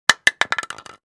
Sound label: Small plastic item drop onto hard surface; impacts; gfxsounds